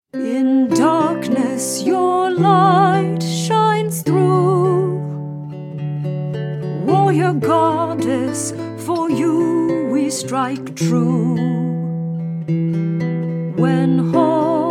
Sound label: Hymn sample (Female); Hymn to Kyne